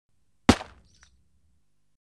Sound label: Suicide Splat; Halloween Sound Effects